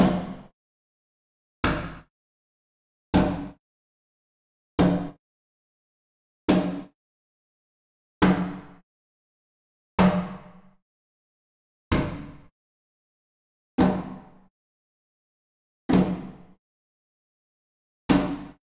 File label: Gamelan; Propeller Island - Complete Gamelan